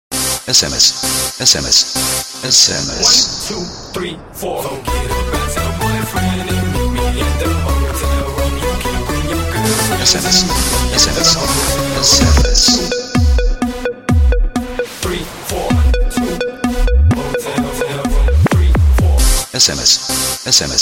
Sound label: sms mix sms